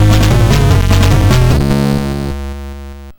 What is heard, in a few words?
Jingle (Fast)